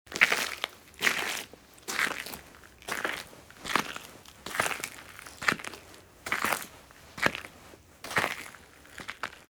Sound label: steps in snow